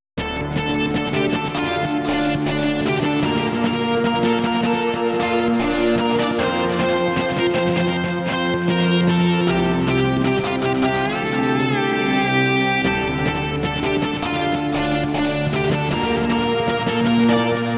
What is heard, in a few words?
guitar; space commander x